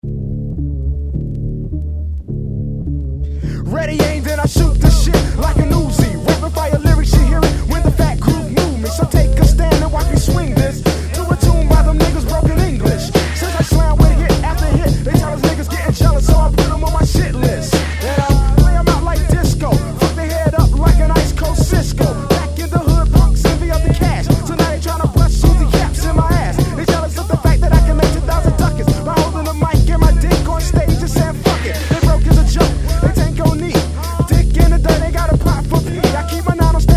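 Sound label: hip hop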